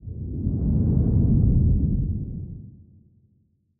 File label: kaiju adult tail swish long low; biogenesis